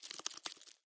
Bug Movement; bugs

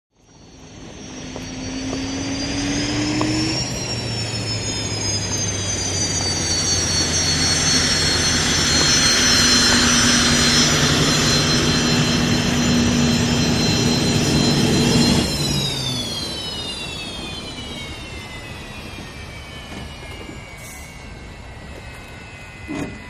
Hydroplane Dock Amb

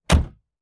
SUV gate close, tailgates

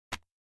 Golf Club Misse

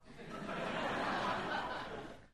Crowd 100 Short Medium, medium int

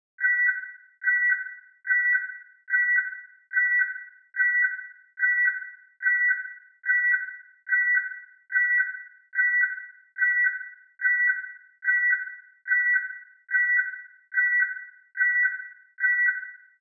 Alarm Damage

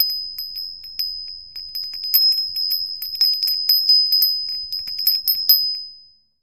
Bell Chime High Meta, doorbells

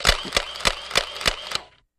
35mm Camera Auto Adv; shutters & movement

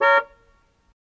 Car Horn Old Sngl Natu, american

